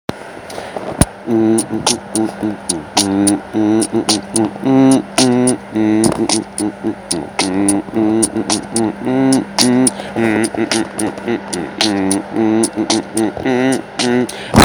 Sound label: Afro Beat Idea